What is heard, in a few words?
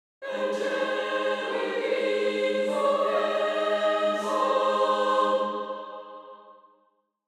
Angelic choir angels; angelic intervention